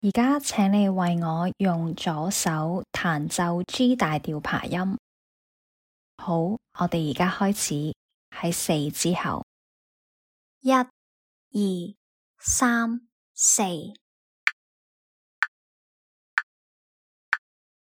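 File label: G major arpeggio left hand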